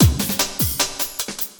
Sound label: LOOP, Drum & Bass, 150 BPM